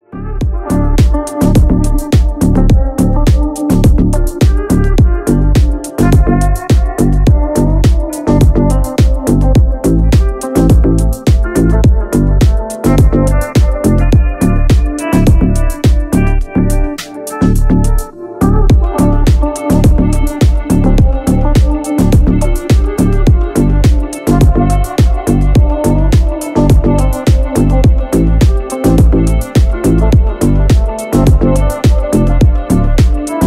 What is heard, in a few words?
deep house